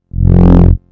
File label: Bass Hit